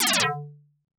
Game Start; Gemini Wing; X68000

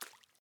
water step; teardown; ps5 windows xbox series xs

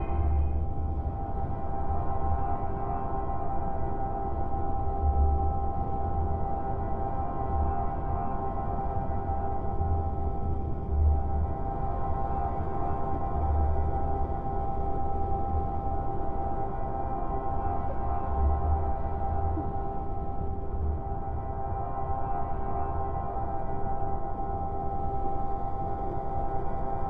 Static; Hotline Miami

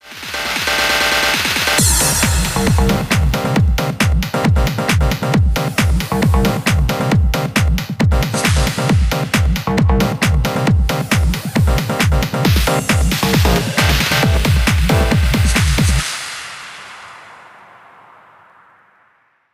Goal Last Elec; Gran Turismo 5

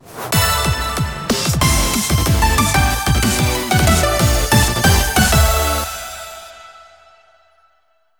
Stage Clear!

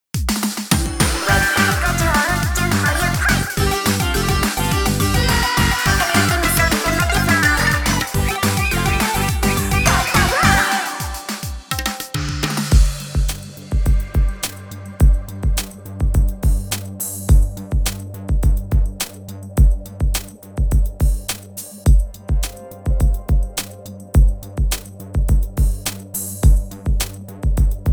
Victory; Team Frye, Phase 2

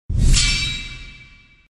Clash

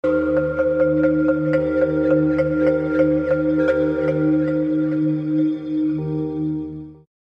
Intro Ambient Knocks; Summer Catchers

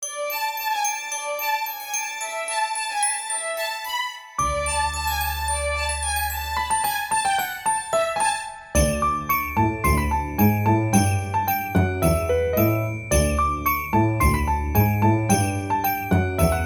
Menu Win; Roombo: First Blood

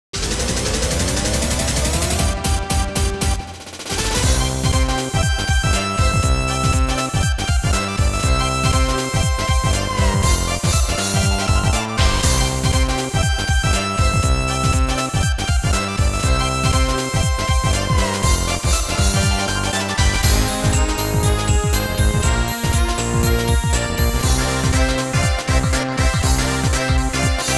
Battle Mode - Victory; bomberman 64 the second attack hd n64 gamerip 1999

